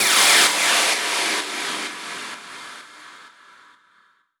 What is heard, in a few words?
FX Downlifter